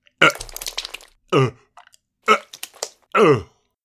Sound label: Elderly man vomiting; gfxsounds